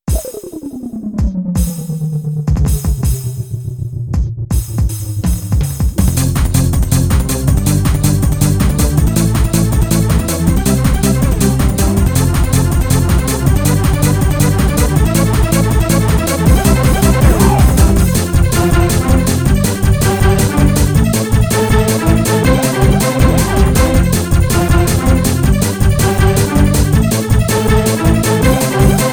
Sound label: polka